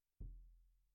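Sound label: Snare Bottom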